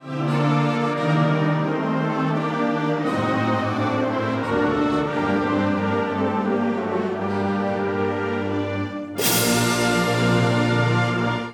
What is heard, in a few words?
Marching Band